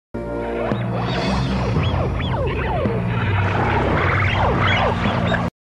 Roar; Queen Bee

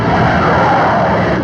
Sea Serpent Roar

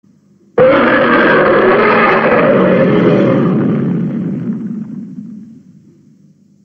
roar; MandaS.P

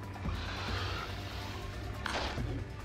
Vinestrangler sound

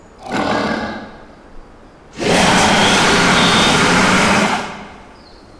Death Jackal roar; Titan Chasers

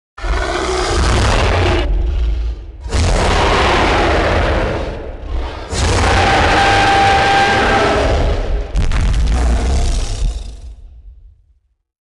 King Kong roar; 2005game

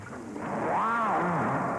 Zarigaros Roar

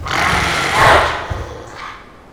roar; Magma Jackal

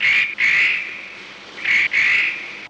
Condor

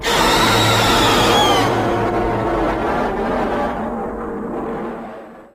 Roar; Destoroyah; Heisei

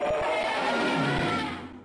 Roar; Maguma; Showa

